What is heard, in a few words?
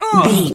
voice male hurt pain